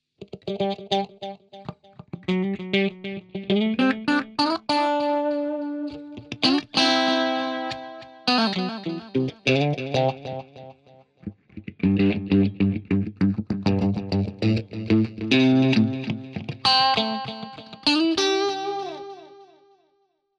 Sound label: Git FX Medium Delay; Presonus Studiolive ar22 usb